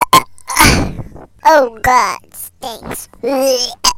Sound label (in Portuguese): Vômito Chipmunks